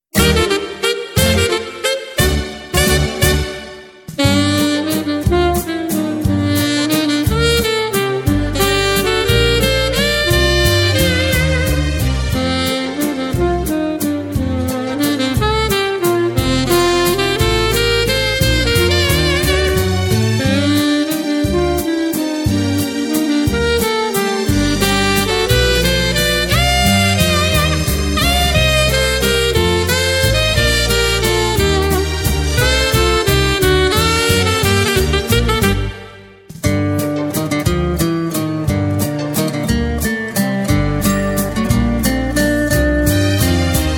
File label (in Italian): sax emozione